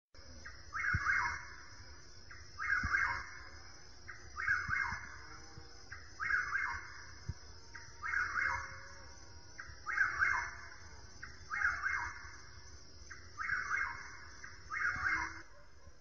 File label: whippoorwill; animals